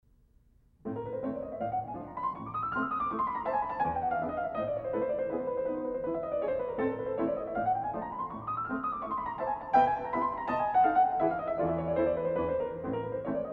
Etude for piano in A minor; Chopin- Etudes (Complete)

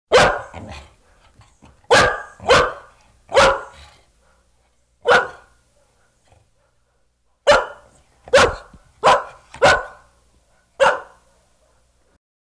Big Dog Barking; Halloween Sound Effects

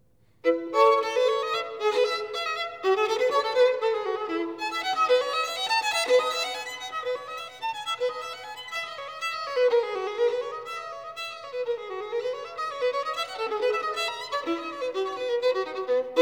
Violino Solo; Johann Sebastian Bach; Sonate e partite per Violino Solo; J.S. Bach - Partita No.3 in E major BWV 1006 - Bourrée

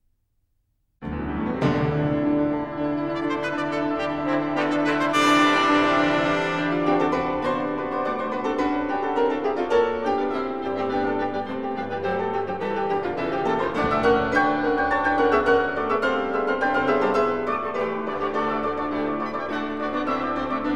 Piano Concerto, Allegro

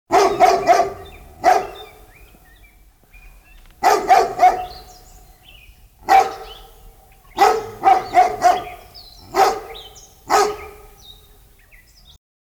Dog Barking Outside - Close By; Halloween Sound Effects

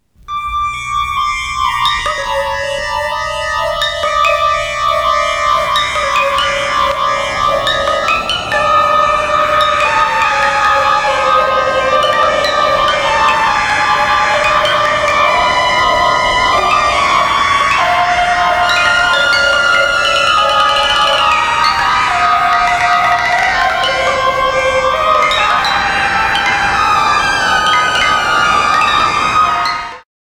Horror Theme; Halloween Sound Effects

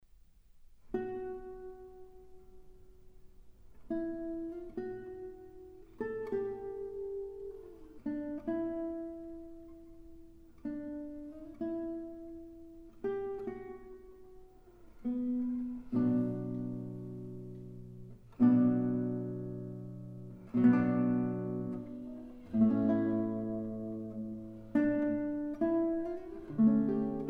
Prelude for piano in E flat minor

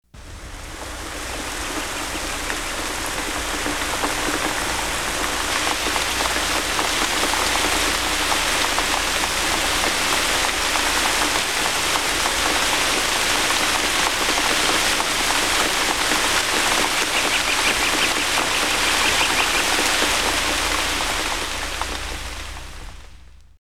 Wind Tru The Trees Thunder; Halloween Sound Effects

